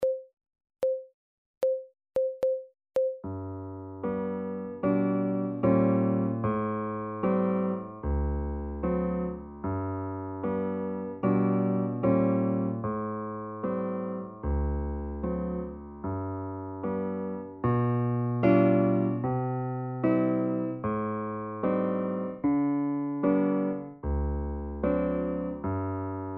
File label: Slow Fox 75 Secondo; Seiber